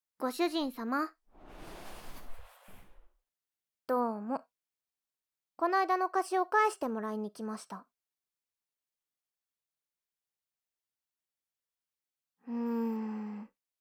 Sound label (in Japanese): メイドさんのバーチャルマッサージ; 3DASMRメイドシリーズ